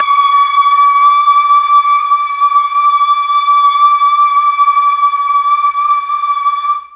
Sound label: D4 BOYS CHOIR, Mellotron; Mike Pinder - Mellotron Archive CD